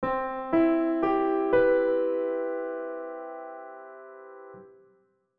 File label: c major 7 chord